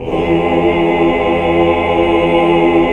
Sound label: MIN, CHORDS; Sound & Vision - Gigapack II CD 1 (Roland); HAL Greg.Chords1